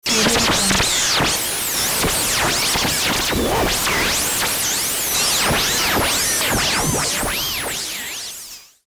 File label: static interference; sound ideas